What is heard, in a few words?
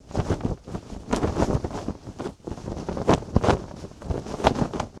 Flag Flap loop